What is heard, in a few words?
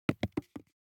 DICE on Wooden Tray, Throw and Roll, Foam, 1 One Die; Samples; ArticulatedSounds; DICE Sfx Party (Extended)